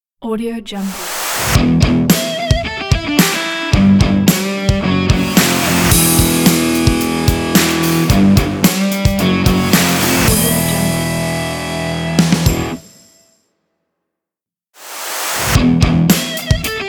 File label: rock logo; rudydrunk